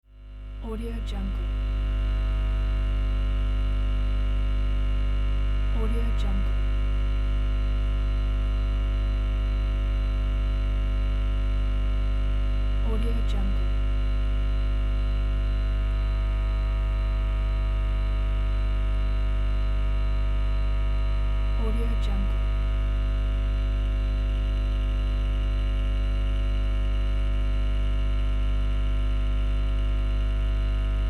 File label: guitar amp noise; jtc-studio